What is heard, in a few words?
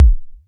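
vermona drm1 mk3 bass drum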